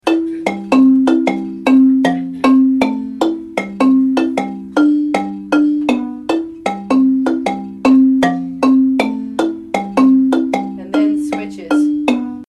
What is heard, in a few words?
tenor intro, marimba; Second tenor; babamudiki